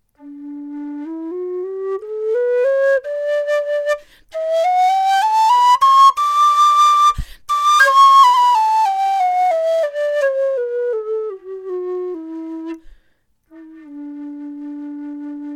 lowwhistle einfach tonleiter; dixon; Die Low Whistle ist die tiefere Variante der irischen Tin Whistle

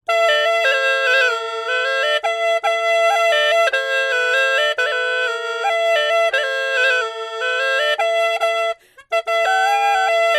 hulusi einfach hoch

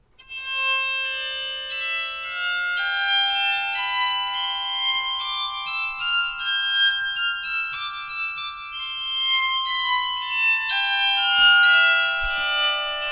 streichpsalter sopran tonleiter

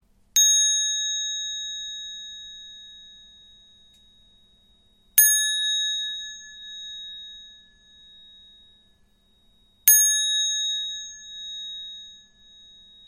klangschale mini

